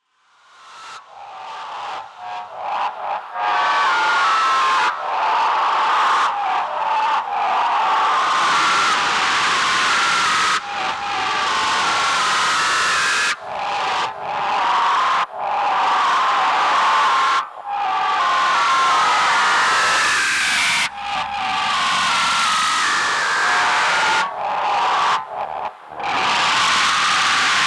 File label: Interference Field Transmission